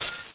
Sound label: ui button; Quantum Redshift